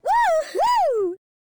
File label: Woman Woohoo; Zomboid; Lifestyle